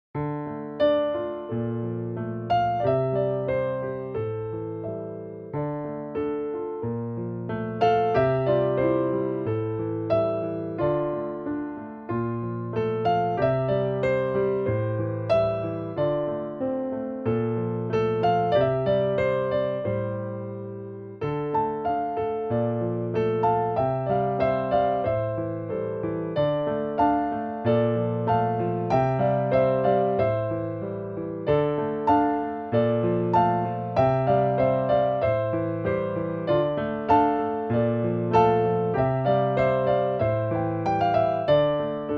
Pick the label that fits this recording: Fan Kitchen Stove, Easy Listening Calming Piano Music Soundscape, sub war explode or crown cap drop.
Easy Listening Calming Piano Music Soundscape